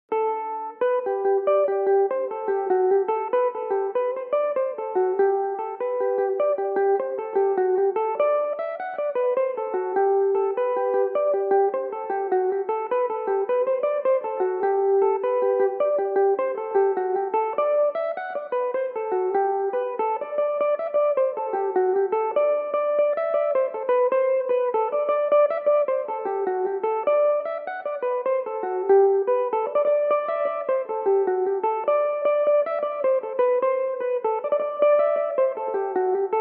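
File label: G major, Irish mandolin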